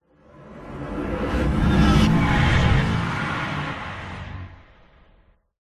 futuristic vehicle passby animation particle swish swoosh accent logo sci fi futuristic; alien